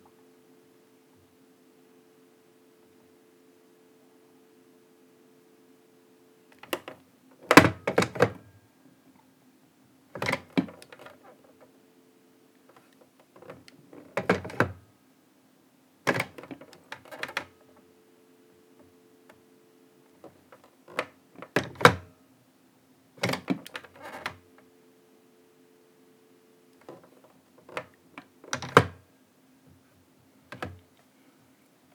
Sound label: Rotary Phone Pick Up Put Down